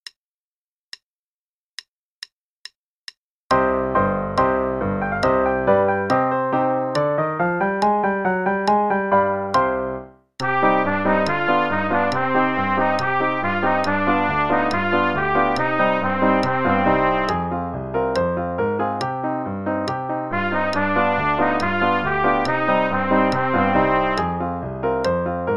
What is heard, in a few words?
Play-a-long both parts, qn=140, trumpet; Belwin; The Pedlar